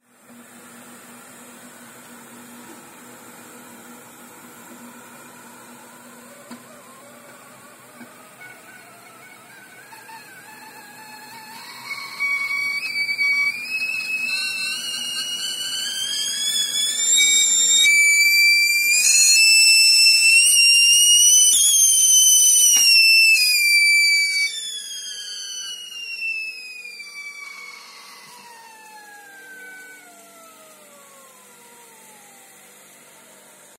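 svist chainika na gaz plite, kettle whistle on a gas stove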